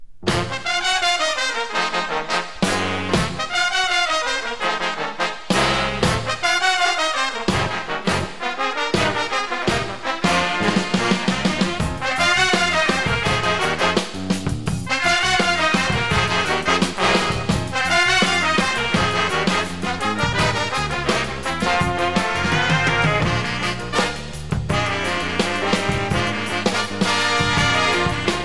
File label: jazz ensemble; denison